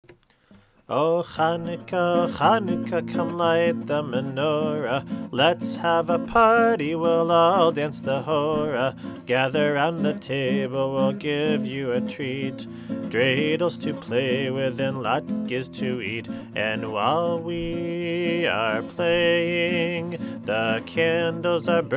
Oh Chanukah English Yiddish Hebrew

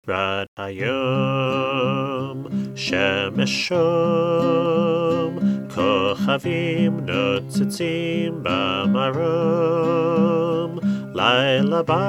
Taps in Hebrew; Rad Hayom

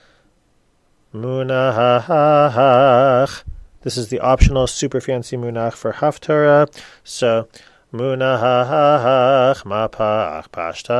super fancy munach haftarah

